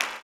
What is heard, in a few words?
CLAP, blues & soul; ILIO; Vocal Planet